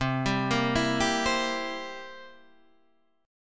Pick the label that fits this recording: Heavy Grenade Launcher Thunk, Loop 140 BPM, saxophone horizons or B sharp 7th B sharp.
B sharp 7th B sharp